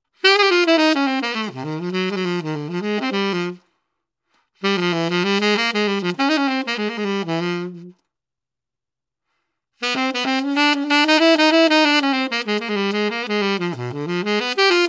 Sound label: Legere Fast